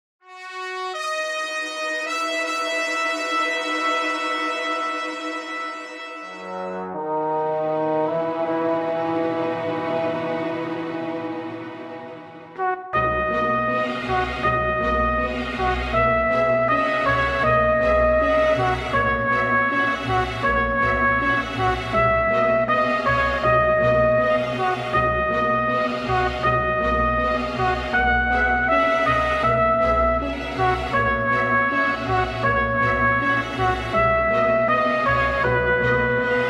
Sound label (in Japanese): 河川敷でトランペットを奏でる少年